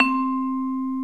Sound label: MARIMBA VIBES